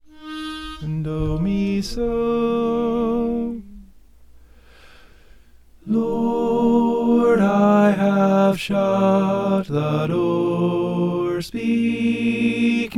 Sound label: tenor